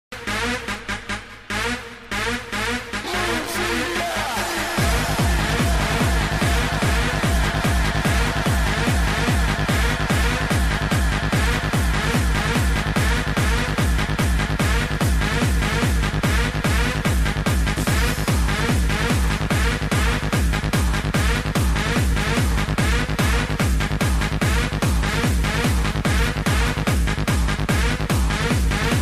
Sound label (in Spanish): musica